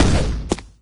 ceiling attack no voc, wraith; doom3

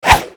swing whip

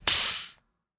hydraulics, car

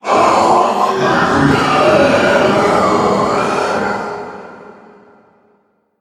vox death

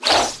glaive throw

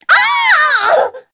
succubus dying; queen girl

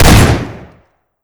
shotgun single

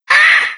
pain, cherub; doom3

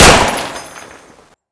shark bite

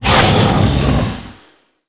worm striking, giant worm

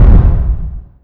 mancubus step; doom3

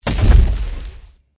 jump up, power armor; clothing system

